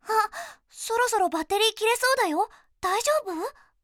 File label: critical battery; Windows; Madobe Nanami